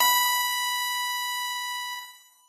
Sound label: piano; pianojs